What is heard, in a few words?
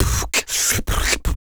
Human Beat Box, Drum & Bass; Loopmasters